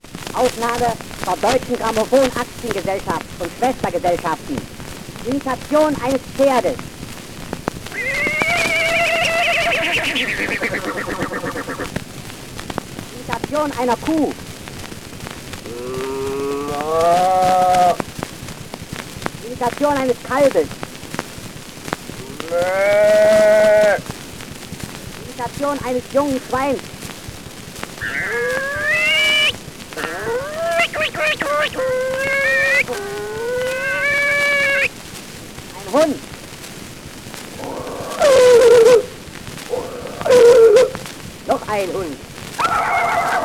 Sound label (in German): Thierstimmen Imitation